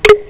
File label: click